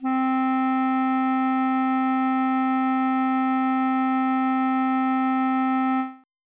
clarin

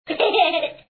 cry; water

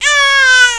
baby

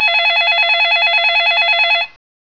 Telephone electronic, mono; ClipMedia

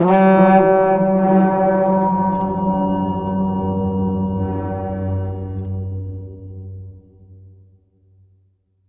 horn; guborom

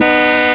horn

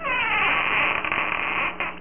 CREAK; dosgames; dragon

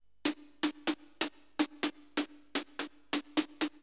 SNARE HOUSE 125, Drum; eJay

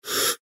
Inhale Breath, Blowgun; Survivor Ultimate

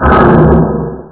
Door Slam; Realmz 5.1.6

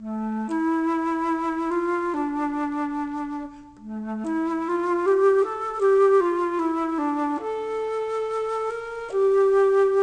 ALTO FLUT

